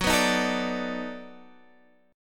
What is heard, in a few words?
B 7th Sharp 9th